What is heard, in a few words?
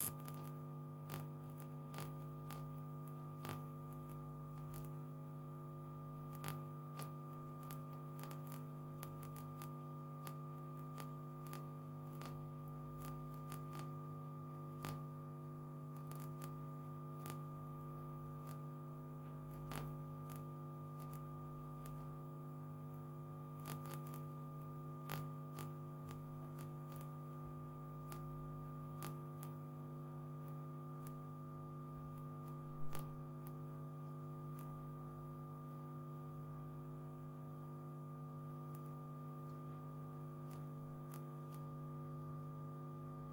Vhs Video Tape Playback Hum Crackling Through The Speaker